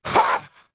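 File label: attack; The Elder Scrolls III Morrowind GOTY; XBOX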